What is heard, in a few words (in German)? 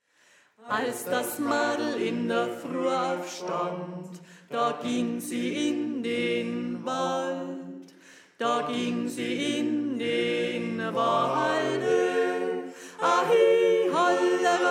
Als Das Madal In Da Fruah Aufstand Alt, volksmusik